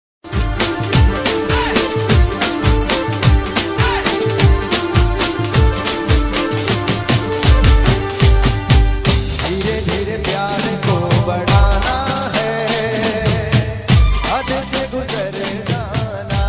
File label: gujarati romantic